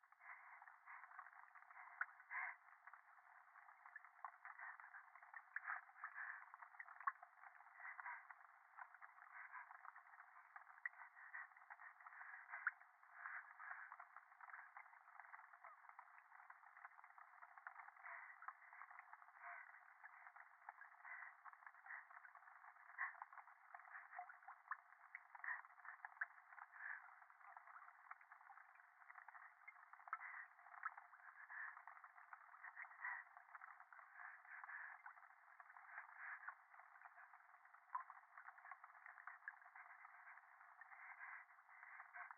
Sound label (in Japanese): 電話 左耳快感吐息 雨上がり